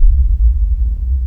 Korg, FX

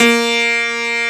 STUDY PNO; East West; Global Sounds

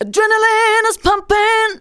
vox, Techno Trance; Ueberschall

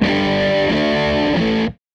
Les Guitar Loop; Sonic Foundry (Sony Creative Software) - Six-String Orchestra

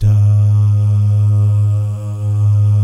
DAH, Vocal Jazz; ILIO

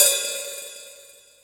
Misc Cymbal; Mo' Phatt; Digital Sound Factory Mo' Phatt

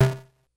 Snare, Drum Machines, Korg DS-10

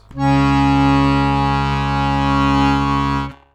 ACCORD BASS C#1; Best Service; World Colours

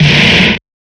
Snares, Alesis Performance-Pad

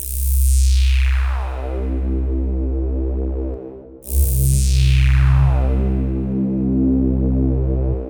philter (sweep drone); Sonic Foundry (Sony Creative Software); Ugly Remnants VOL-2

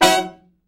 Killer Horns, SECTION 7TH; Best Service

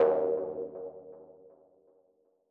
Mono DBeat D#2; KG MegaSaur

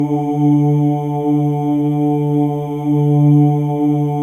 MALE OOS, Symphony of Voices; Spectrasonics

